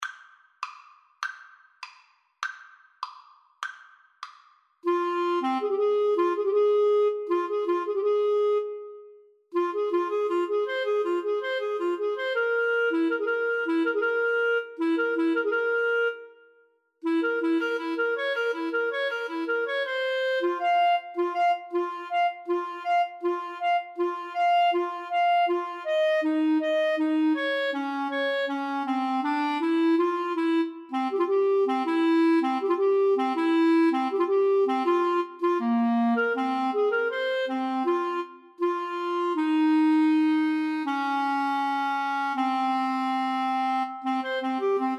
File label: Clarinet Duet